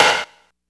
DR660 DANCE, DRUMS PERC; BOSS; Rubber Chicken Software Sample Bank

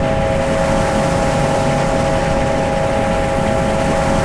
TRUCK; Various FX & Atmo; Distored Reality 1&2

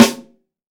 METAL, LUDWIG SNARE

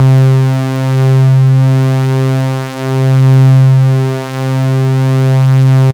Synth, Raw Analog Multi's; Dream Zone

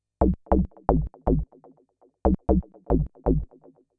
SH101 Bass Loop (120BPM), retro house

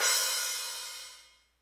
crash, Hardcore Breakbeat; Big Fish Audio; Hardcore Breakbeat Action; Rhythms 4 Life 151 Ebmin

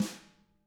tama hit; ILIO; Double Platinum Drums 1

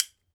B. BONES, Celtic Percussion; E-MU Producer Series Vol. 7 – Old World Instruments

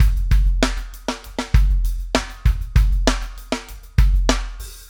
SHRED, Backbeat

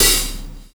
Adrenal HiHat; Sonic Foundry (Sony Creative Software); Drums & Drones; Construction Kits

